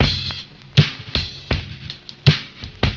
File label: Drum loops, Boombap